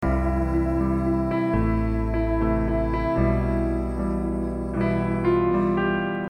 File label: Bass